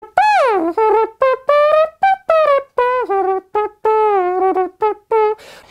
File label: Trumpet